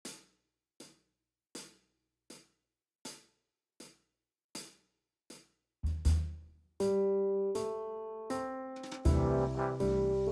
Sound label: Easy Life Slow Swing